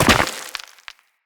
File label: Land Soft Rock, Footsteps; Characters; Operator